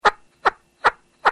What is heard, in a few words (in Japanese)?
琴ヶ浜の砂をガラス容器に入れて、ガラス棒で搗いた音, 琴ヶ浜の鳴り砂。速く突いた音